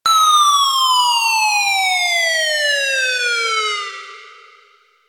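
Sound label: hyun fall; 【効果音】ヒューンと落下する音; ヒューンと穴に落ちる音、ボールが落ちてくる音、アニメやゲームなどで使われるコミカルな落下音です。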